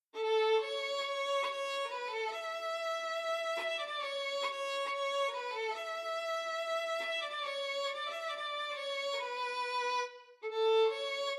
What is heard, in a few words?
violin